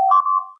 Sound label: alert destroyed